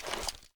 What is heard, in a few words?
mag emp, m60; svencoop; ins2